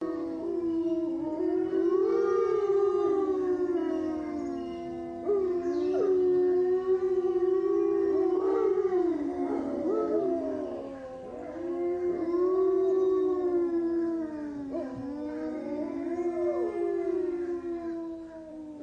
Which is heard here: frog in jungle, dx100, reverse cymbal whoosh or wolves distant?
wolves distant